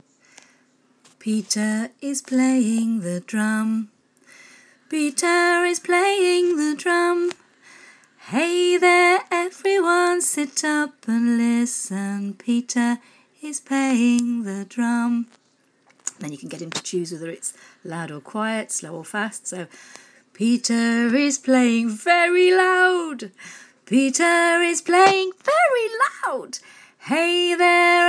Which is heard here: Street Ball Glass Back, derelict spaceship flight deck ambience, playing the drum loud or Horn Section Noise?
playing the drum loud